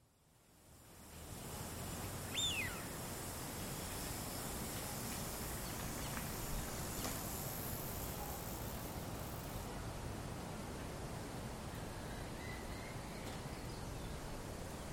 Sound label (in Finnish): haapana, linnut; koiraan soidinvihellys